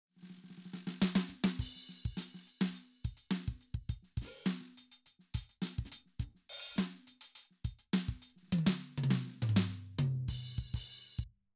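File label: Birch Latin, drums; Simmons SD1200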